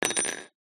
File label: household key mortice drop down wood floor